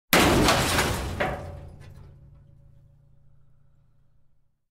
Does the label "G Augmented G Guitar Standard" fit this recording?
No